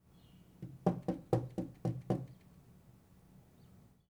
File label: Tap on Window